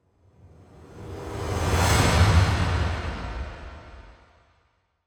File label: Riser